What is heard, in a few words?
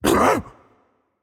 surprised, voicepack; smite; jorm